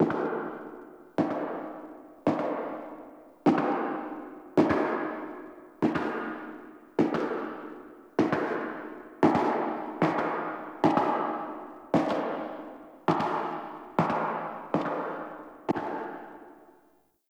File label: Foley Walk; Cinematics; Warframe; Orbiter Cradle